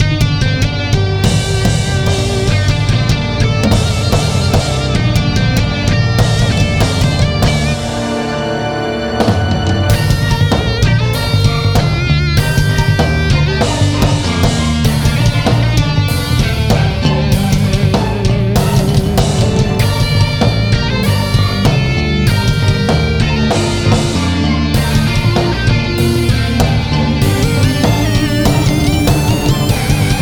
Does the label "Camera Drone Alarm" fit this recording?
No